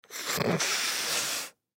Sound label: Nose Blow Element Female Young Girl; Sick; Human; Blastwave